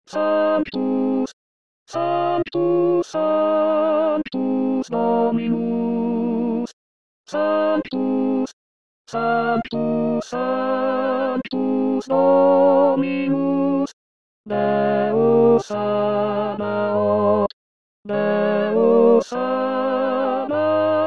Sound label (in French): sanctus voix tenor